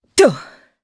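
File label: Vox Landing, Japanese; voices; heroes; Morrah; kingsraid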